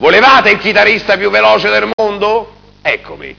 zombie alert

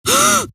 gasp, scientist female

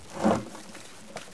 rope pick; buttons

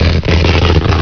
sparks

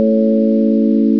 female pain

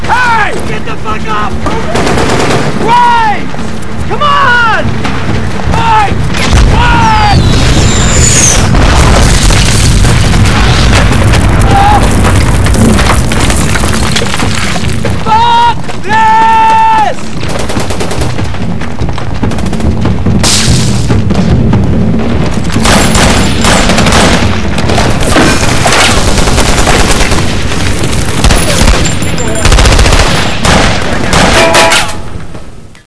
battle

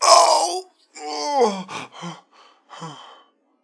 low health; doctor

